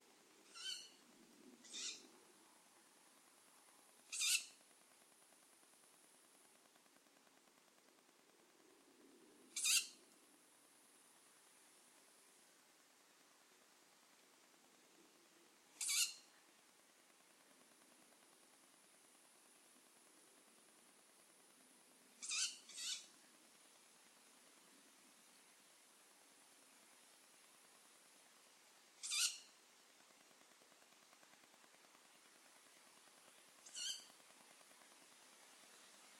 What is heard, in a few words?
ural owl chicks